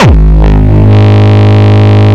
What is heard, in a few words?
Longkick, kicks